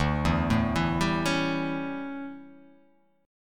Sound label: D Major 7th Suspended 2nd Suspended 4th D